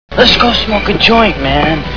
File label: smoke joint